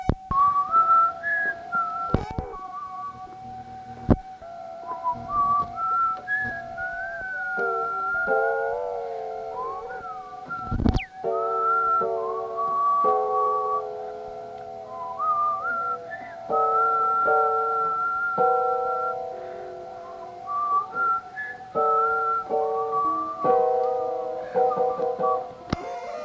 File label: cloud chord